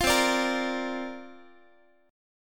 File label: C 7th Suspended 2nd Suspended 4th C down Guitar